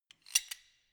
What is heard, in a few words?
wrench close; schrauben drehen hydraulik